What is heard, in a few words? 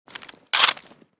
sks open, weapons; Mask's Reanimated Anomaly Arsenal